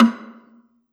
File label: Gambang Hard Beater, Gamelan